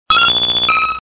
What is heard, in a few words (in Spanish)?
Grito de Persian